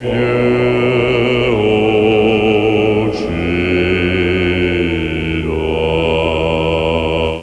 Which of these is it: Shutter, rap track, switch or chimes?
chimes